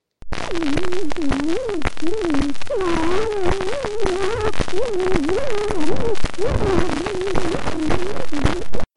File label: wobbly; auclair